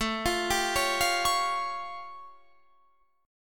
A Augmented 7th A; x,x,19,22,19,20,19,22

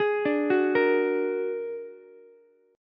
A sharp Augmented 7th A sharp Ukulele; A#+7 arpeggiated; JGuitar

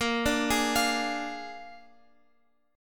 Bb 7th Bb down Guitar Open F; Bb7 arpeggiated

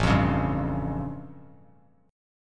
A Augmented 7th A Bass Standard; strummed; 5,8,11,0; JGuitar

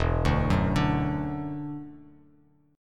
E 7th Suspended 2nd, down, Bass Standard; E7sus2; arpeggiated

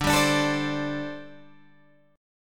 D 7th Suspended 4th, down, Guitar, Open G

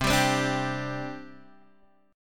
C Suspended 2nd C Guitar Half Step Down

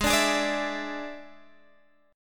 D Suspended 2nd Flat 5th Ab, chord

